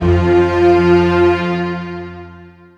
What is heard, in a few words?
Big strings F2